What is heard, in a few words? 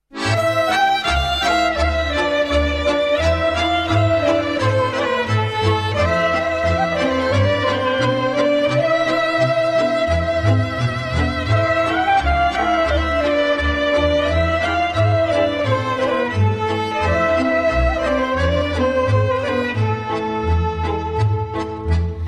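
doamne ocroteste instrumental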